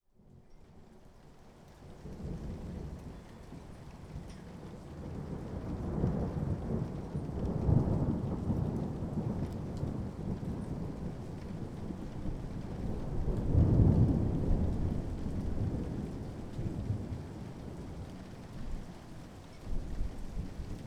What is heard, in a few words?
thunder rear; zoom h2